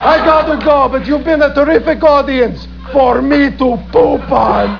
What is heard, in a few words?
audience